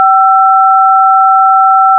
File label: touch tone 5; Phone Digits